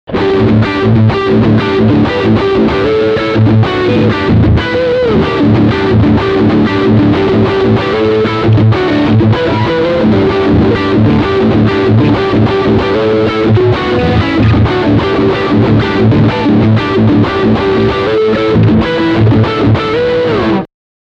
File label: Riff Front Humbucker